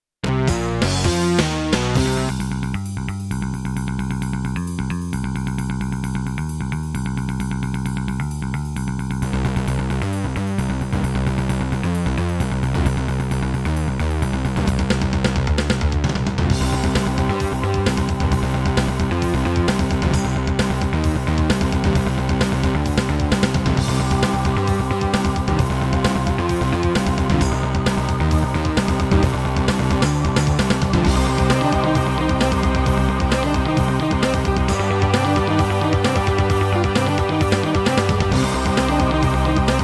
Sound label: Roland XV-2020; sigil doom episode 5